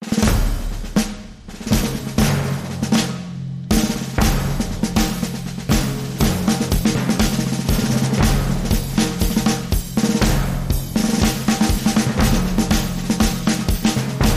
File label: Majestic March, No Chime